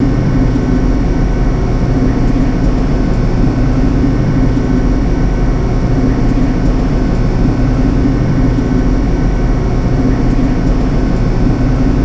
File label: elevator